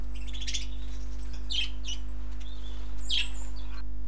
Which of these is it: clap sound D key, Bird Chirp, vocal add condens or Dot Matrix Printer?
Bird Chirp